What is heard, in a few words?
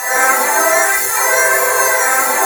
SY85; ABYSS; Rubber Chicken Software Sample Bank